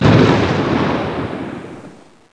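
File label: XPLOSN, surface explosion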